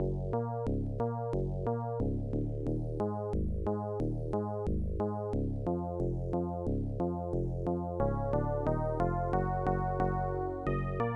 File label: ditty